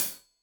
Hat Tight, Hi-Hat, Cymbals; Deeply Sampled Drums

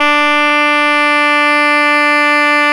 D 1, schluetter; SoundBytes - Early Patches

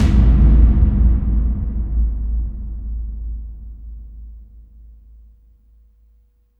CAVERN TUB, AMBIENT DRMS; Spectrasonic Distorted Reality 1

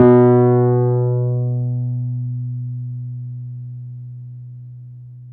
PNO, Wurly

